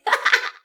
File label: giggles and laughter; SyrinScape